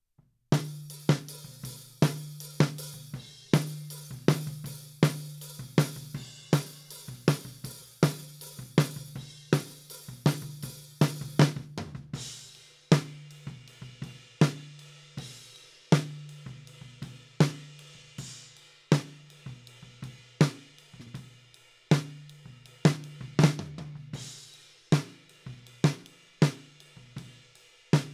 Snare Top, 160 BPM

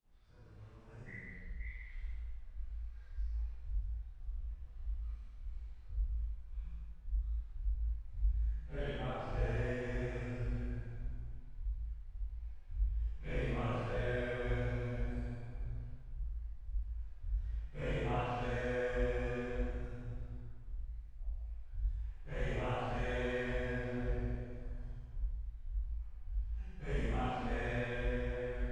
Choir

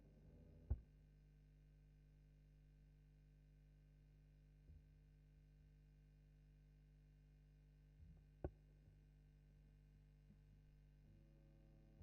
GTR B

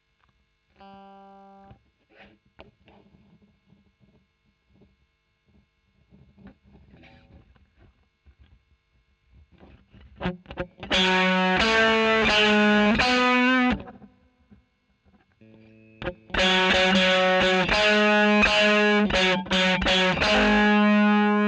E. GTR Royer OD

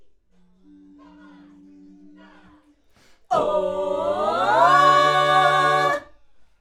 Schoeps AKG, piano

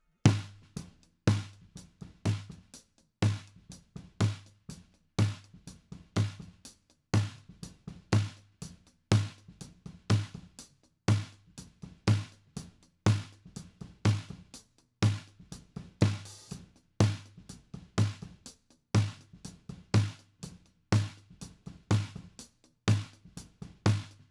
Snare T

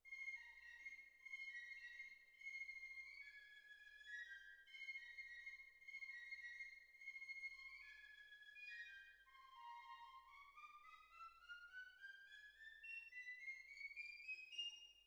Cbasses

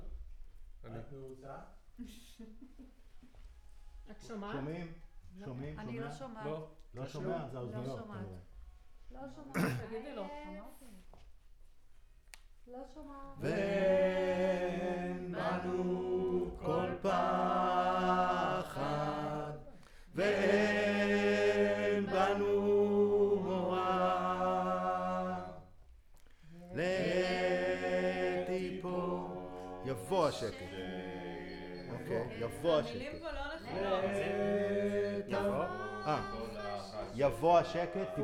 Center u47, Choir

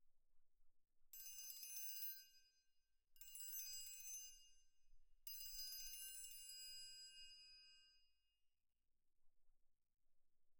Perc

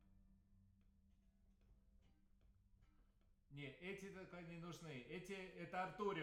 PIANO

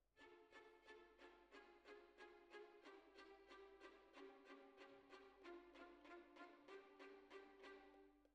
Violins, jazz for piano

